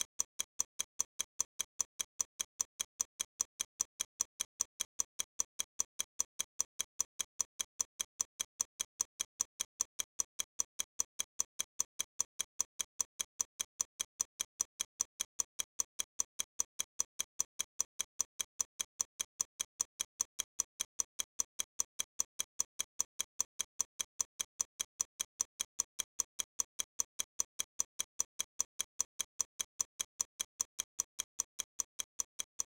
Timer Ticking; Nintendo 64; Banjo-Tooie

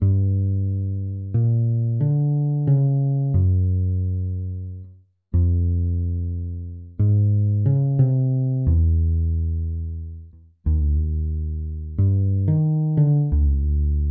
Bass Practice Music; Nintendo 64; Legend of Zelda, The - Majoras Mask

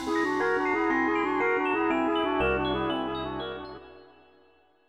Magic Jingle; Banjo Tooie

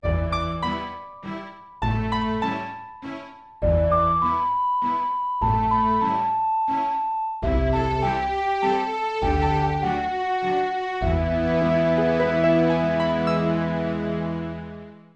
Minuet; Zelda - Ocarina of Time

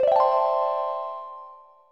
SFX Brentilda Appears; Banjo Kazooie

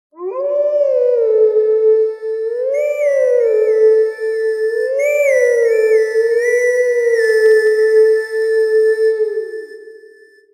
Horse Grass (Howl); Legend of Zelda, The : Twilight Princess

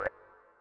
Switch to Blue Eggs; Banjo Tooie

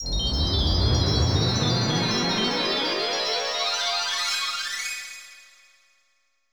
Transformation; Banjo Kazooie

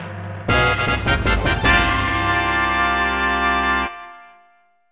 Unused Fanfare; Super Smash Bros

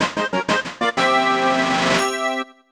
Course Clear; Super Mario

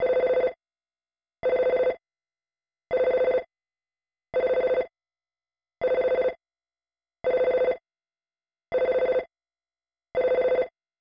Phone; Super Nintendo; EarthBound

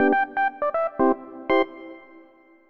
jingle; Nintendo 64; Perfect Dark

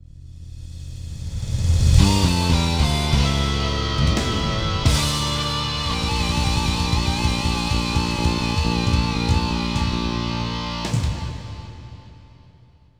Game Over; F-Zero Series; F-Zero X

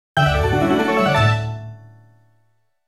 Get Baton Song; Legend of Zelda Series; Wind Waker

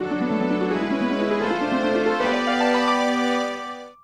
Fanfare; Legend of Zelda, The : Majora's Mask

